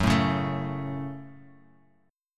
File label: Gb Minor Gb; strummed; JGuitar